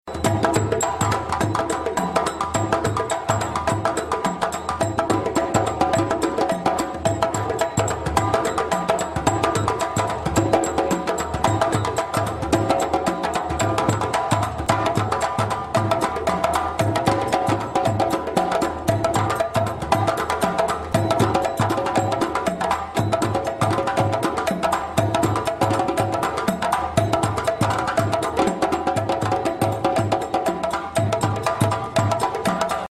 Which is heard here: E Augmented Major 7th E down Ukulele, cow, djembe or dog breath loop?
djembe